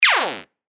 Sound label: Laser, Synth; synth shots tech house; Raichu; revealed